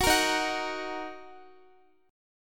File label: D sharp Minor, Guitar; strummed